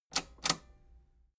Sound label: metal button robust spring click disable slow